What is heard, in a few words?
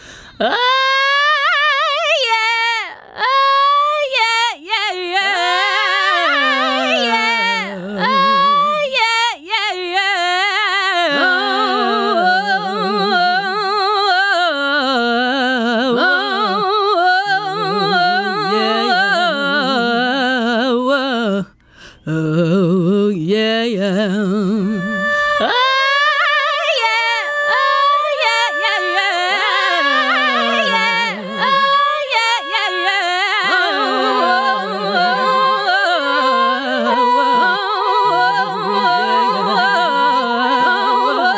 Electric Trains